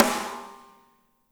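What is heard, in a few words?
snare right; Spectrasonics; Burning Grooves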